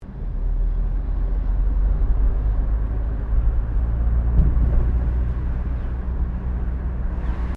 CAR HOLDEN HD PREMIER ACCELARATE, Classic Australian cars; Holden Hd Premier 1965; Vehicles